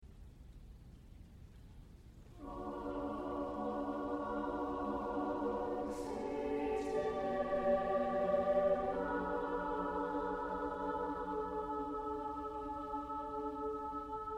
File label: satb